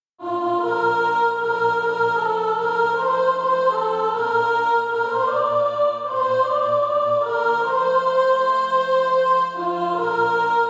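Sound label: soprano, Practise Music; Forever with Jesus There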